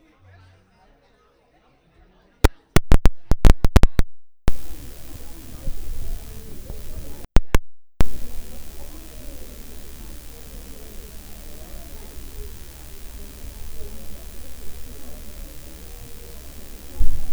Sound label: Before The Service Recorded With Broken Microphones, Church; Church Recorded Using Broken Microphones II